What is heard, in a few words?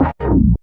OWW; East West - Phat & Phunky CD2; 90's Sample CDs